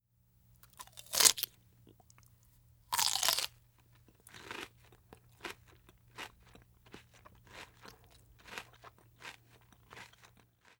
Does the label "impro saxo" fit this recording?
No